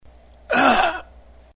pain

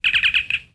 communicator, interface

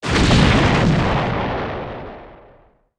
explo large

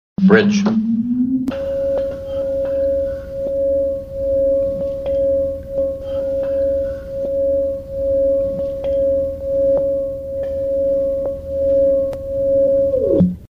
turbolift, ambience

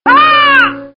pain; chekov

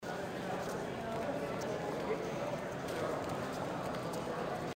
market; colony7

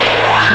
remote

